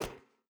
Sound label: footstep barefoot metal run; Rust